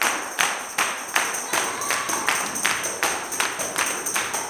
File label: 160 TAMB BRK; Blues & Soul